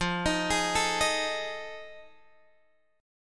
F 7th Sharp 9th, Guitar, Open G

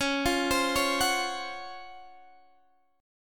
C sharp Major 11th C sharp, Guitar Half Step Down

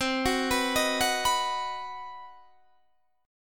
C Minor Major 7th Flat 5th chord, Guitar Standard; arpeggiated; JGuitar

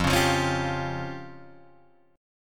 F Suspended 2nd Sharp 5th F down Guitar Drop D

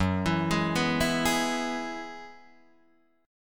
F sharp 9th Suspended 4th F sharp Guitar Drop D

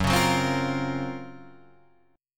F sharp Major Sharp 11th, Guitar, Drop D

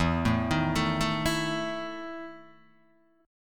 E Major 7th Suspended 4th E, Guitar, DADGAD; chord shape

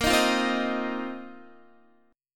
A sharp Suspended 2nd Suspended 4th down Guitar; A#sus2sus4 strummed

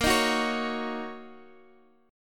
F sharp 6th, chord

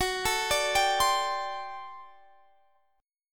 G Major 7th Suspended 2nd Suspended 4th, Gb

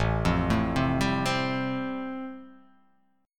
A-Minor 7th Sharp 5th, chord; x,0,3,2,0,2,2,x; Am7#5 arpeggiated; JGuitar

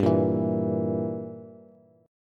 G Minor 6th, Bass; Gm6 strummed; JGuitar

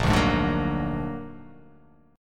A sharp Augmented 7th; x,1,0,1,2,1,0,x

